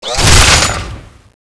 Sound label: draw, weapons; gungnir